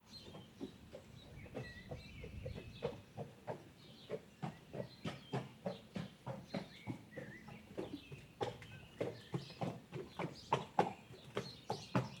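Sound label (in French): Equitation, Paysage sonore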